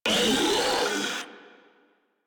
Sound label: Proto Infested Ancient Player Spotted, Enemies Vocals; Warframe